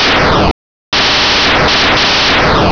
tempest

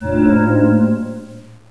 drain

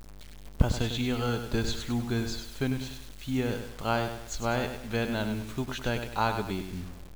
speakers; cs airport

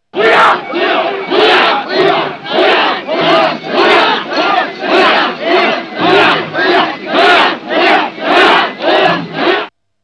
shout; dojo

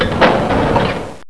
open; wolf

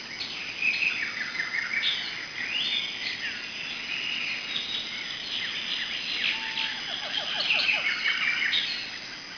Jungle, ambience

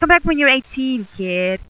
dead girl

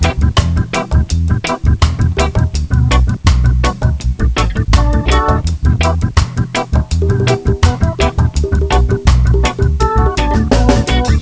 rasta; badstreet

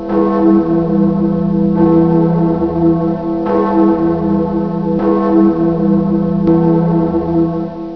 bell

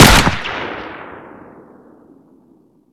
grease gun shoot, weapons; svencoop; ww2projekt

